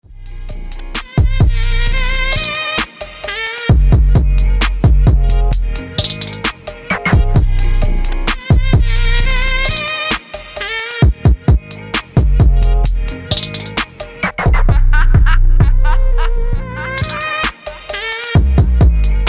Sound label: Phonk